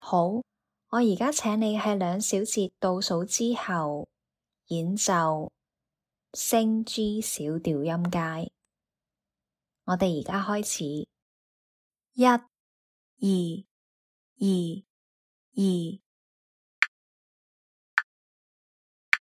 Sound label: G sharp min scale C min scale